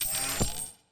win flash; netent

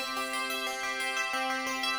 count up slow

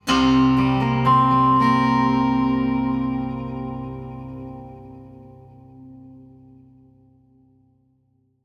ambience slide; netent

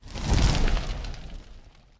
congrats flame; fruitblaze; netent

